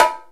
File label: Bongo Hi; Giga Samples Collection; Orchestra